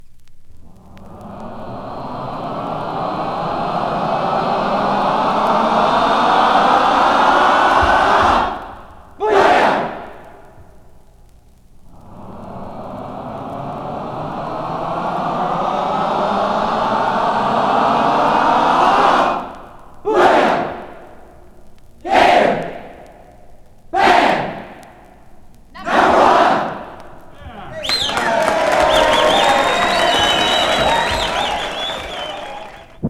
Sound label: Booyah Cheer; 1968; Sounds Of Sixty-Eight